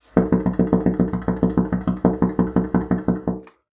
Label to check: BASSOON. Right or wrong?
Right